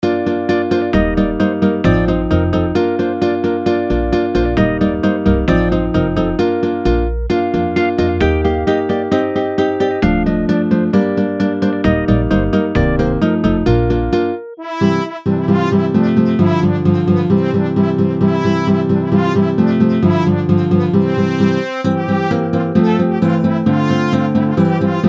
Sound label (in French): Bourree